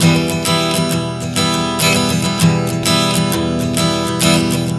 Strum 100 Em, One Chord Strumming 100 bpm

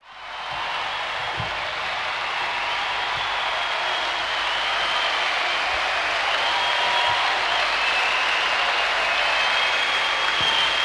APPL, XP APPLAUSE; Best Service - XXL Production Effects

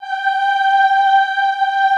AHH G4, Extended Classical Choir; Best Service

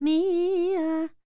Vocal Planet World Voices, NOMADIC

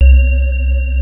SPECTRUM 1 C2, Synth, BASIC WF; Big Fish Audio; Synth City

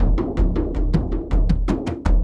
Frame-Kendang Busy, drums; Sonic Foundry (Sony Creative Software) - Friendly Fire

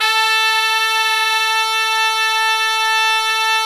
TRP TEN SECT, Pop & Funk Brass; Best Service ProSamples vol.25; AKAI

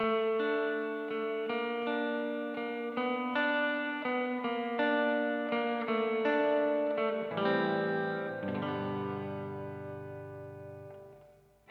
Soul Guitar, 70s Analog Funk; Sonic Foundry (Sony Creative Software); What it Is!

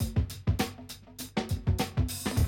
drum loop, 100 BPM

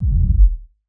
Kick, Gated Reverb; musicradar

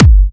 Trance Kicks, Bassdrums

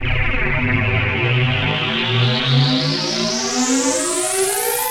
PROPHE FX, SYNTH FX

